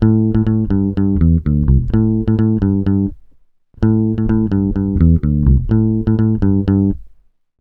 DISCO 126 A, Bass Grooves; USB Soundscan vol.30; AKAI

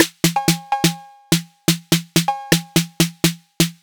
drum loop eight percussion 125; Sample Magic - Classic Chicago House; Drum Loops

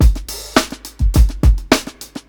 105 16th BEAT, drums; LOOPS; Basement drums vol 2

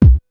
KICK, Hip Hop

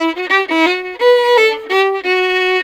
VIOLIN, Celtic

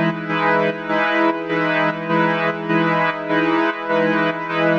Pad 100 E, sidechained

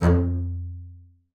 F2, bass spicc; Xsample VOL-5

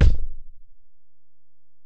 Ac BD, Alesis DM-PRO; 300 Drum Machines

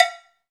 CowBells

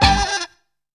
TENSION STAB; Zero-G; Funky Elements

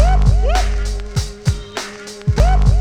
BEAT, Beats 100 bpm, Hip Hop; E-MU Formula 4000 Series Vol. 1; Hip Hop Nation

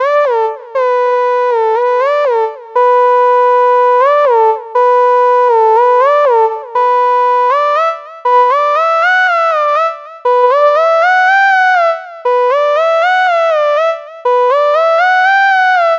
Gangsta Whine; Sonic Foundry (Sony Creative Software); Club Joints and Street Anthems; Drop Top

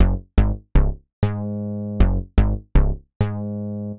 Digi Bass (120BPM), retro house

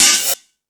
Dance 2000, Drum Machines E-MU Pro-Cussion

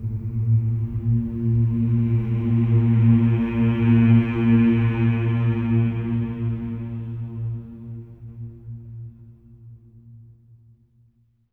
A#2, Symphony of Voices, SWELL; SWELL MMS

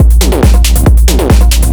drum, 138, drum-loops, Techno; Techno ID; fatboom

